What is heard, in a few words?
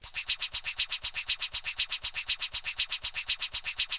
Drum Fx Loop, Electronica